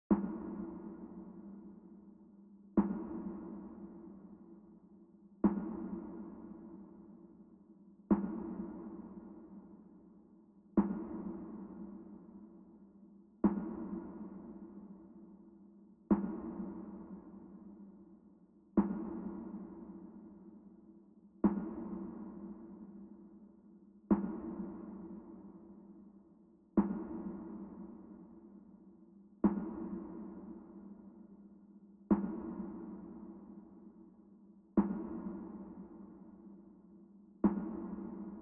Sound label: 90 BPM C Min Percussion; Melody; RnB; Loop Stems; Cymatics; 2022 Melody Collection - RnB Pt 1; Deep Love